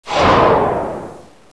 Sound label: push